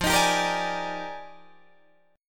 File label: F Minor 13th, Guitar Drop D